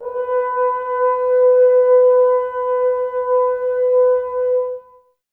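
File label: Brass French Horns, Woodwind Brass Ensemble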